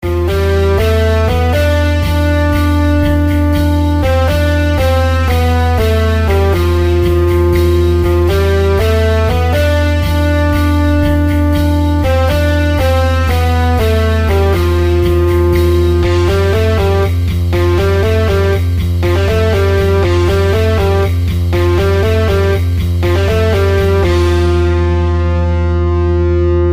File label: tension